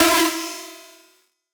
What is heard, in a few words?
synth screech oneshot E; Synth Hits; GhostHack